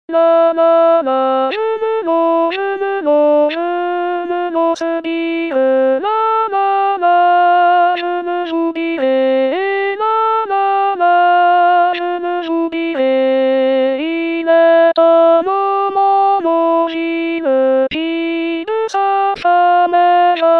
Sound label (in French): alto; Certon